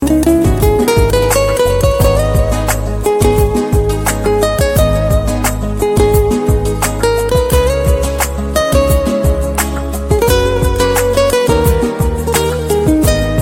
awesome rhythm music for nokia ringtone

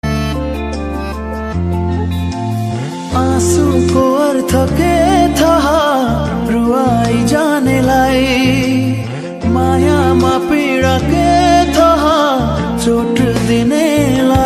sad nepali ringtone

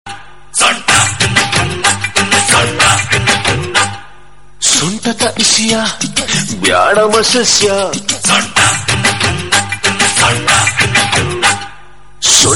kannada tune ringtone

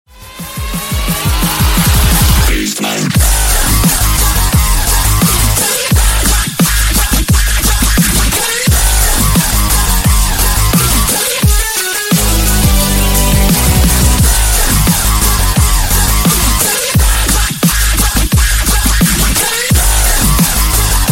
latest galaxy s7 edge ringtone

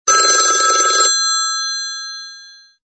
old phone sms remixed ringtone

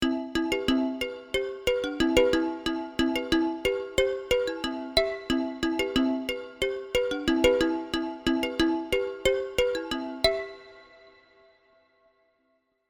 iphone x ringtone; apple

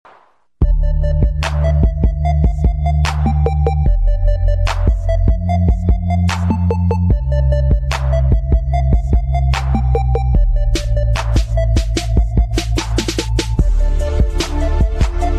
pop iphone ringtone

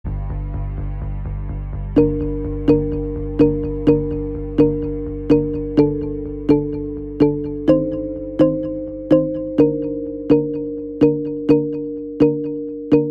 samsung s8 upcoming ringtone

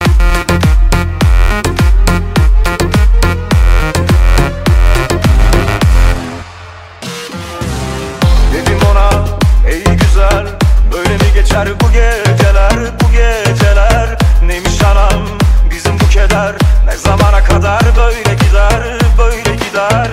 turkish romantic ringtone

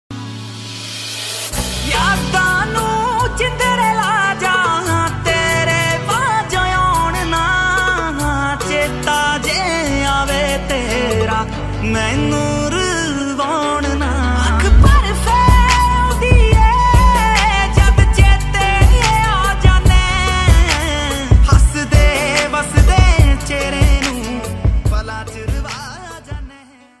punjabi ringtones

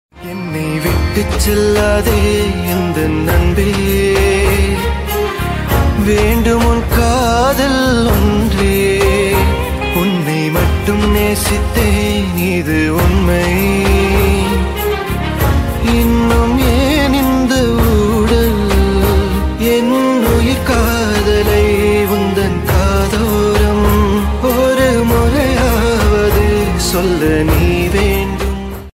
best tamil ringtone

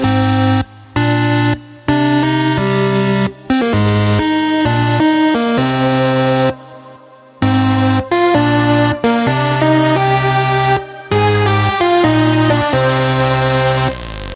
apple iphone ocarina ringtone

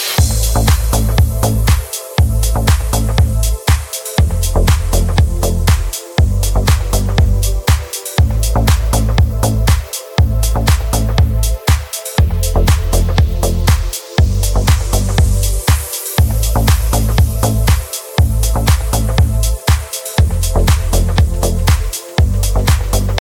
deep oriental ringtone

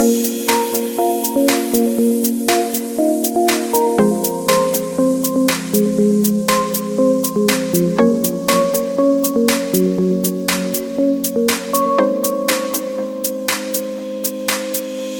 cool instrumental ringtone

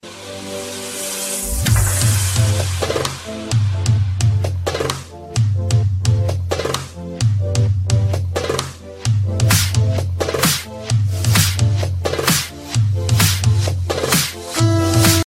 arabic iphone ringtone

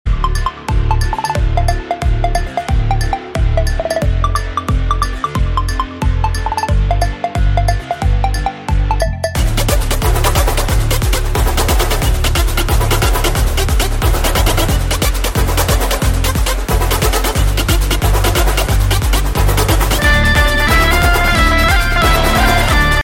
banjo ringtone; rada music

